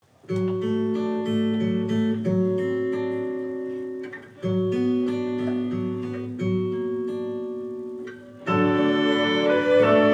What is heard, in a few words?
String Group Silent Night; extra-curricular music